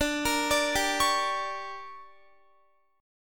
D Major 7th Suspended 4th Sharp 5th, D, down, Guitar; arpeggiated; 4E,4A,5D,5G,5C,6F; chord